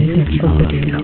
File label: MURMURS; PowerMonger